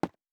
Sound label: Footstep Concrete Running